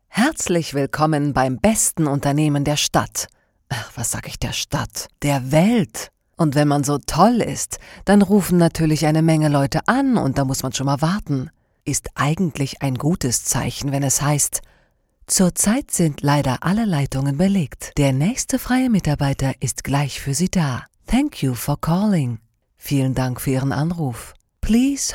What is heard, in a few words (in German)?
telefon warteschleife lustig